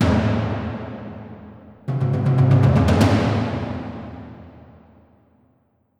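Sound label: Violins spicca; Музыка для фильмов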